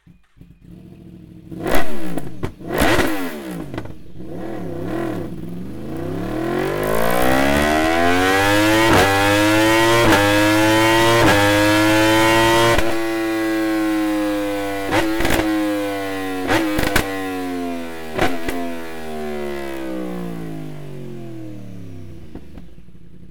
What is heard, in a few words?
slip on with opt link pipe; akrapovic